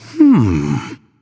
thinking; smite; heimdallr

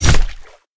melee impact; smite; god odin

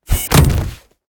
defeat stumble; smite; kuzenbo

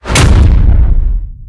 portal splat; smite; god cthulhu

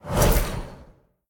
whoosh; smite; god tiamat

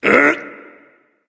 grunt lift; Smite; bacchus

